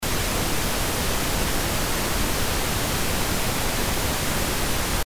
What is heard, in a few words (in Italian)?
Rumore Rosa